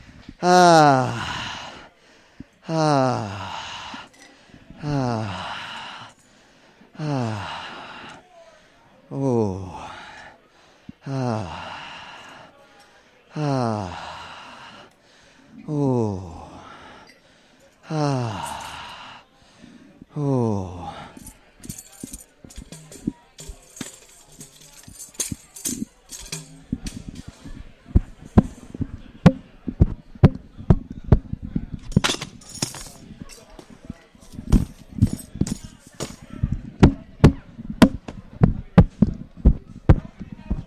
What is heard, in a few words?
The Organ The Beats Come From